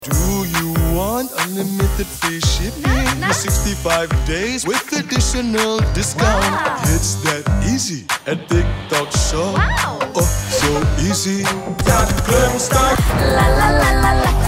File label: JINGLE, ENG, Vintage, Rap, Pop; JINGLE - Tik Tok Shop (ENG) Vintage, Rap, Pop